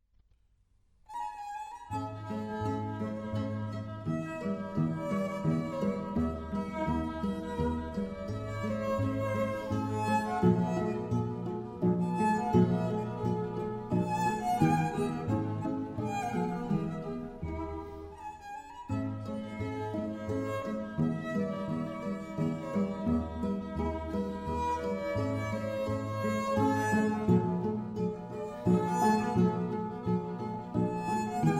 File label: guitar quintet; 3. Menuetto